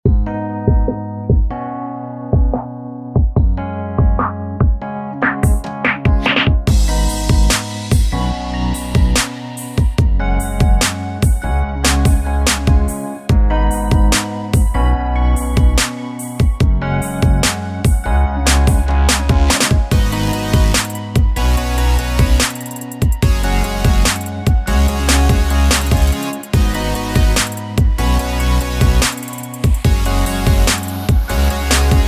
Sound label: rhythm beginner; lv(1) type3